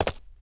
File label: jump, footsteps hard surface; grunt footsteps